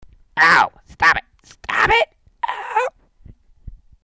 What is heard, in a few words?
choke